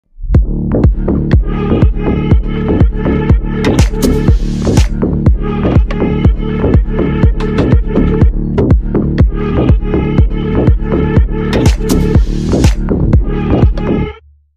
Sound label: epic bgm ringtone; fahadh faasil